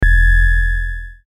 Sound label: Hit High Low, Hit High Low Ring; Sound Design; Blastwave